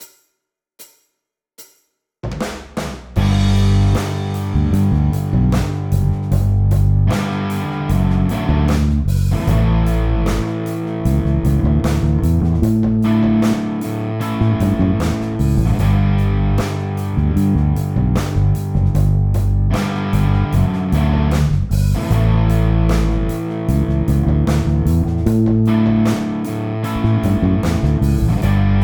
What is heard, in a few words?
Example Backing